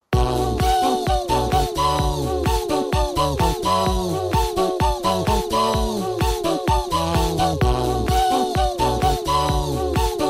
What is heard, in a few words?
Mobile Ringtone